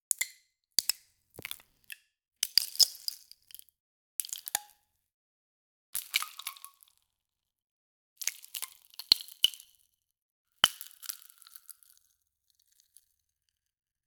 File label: a pristine high fidelity, asmr